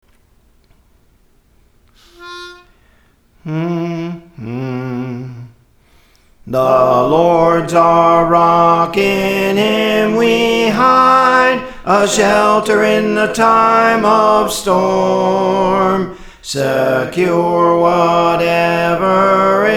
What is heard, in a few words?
Soprano, Praise For The Lord Training